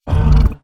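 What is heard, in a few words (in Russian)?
рев быстрая атака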